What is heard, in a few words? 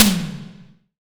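SDS V SNR, snare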